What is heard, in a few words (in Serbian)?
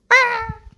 crvić umire